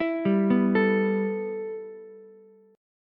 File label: G 6th Add 9th, Ukulele; G6add9 arpeggiated; JGuitar